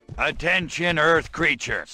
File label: Alert; Crypto